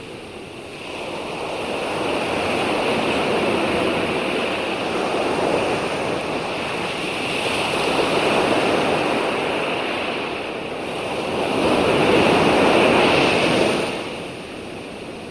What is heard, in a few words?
ambience; CRC 2005 Demo